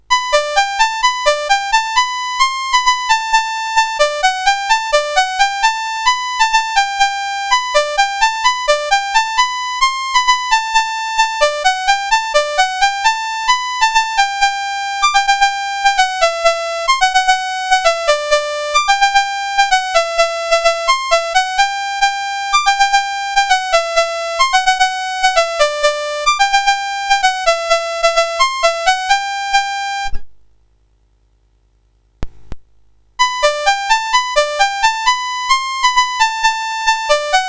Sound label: Piano, CSG8580R5